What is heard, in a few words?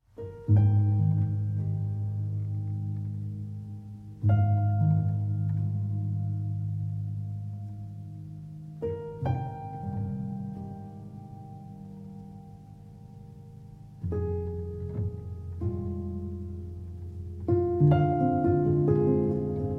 Nocturne in E-Flat Major, for Piano; Op. 9, No. 2